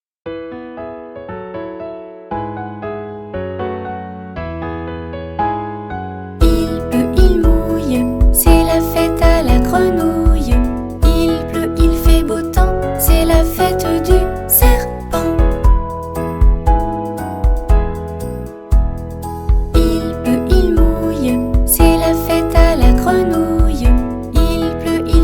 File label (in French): il pleut il mouille